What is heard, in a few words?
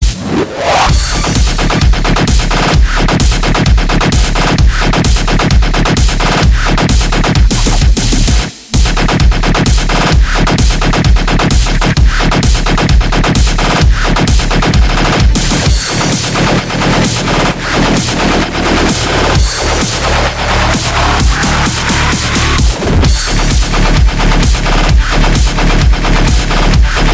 dubstep